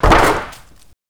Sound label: board damage light; hammer; melee; tfa starwars melee weapons pack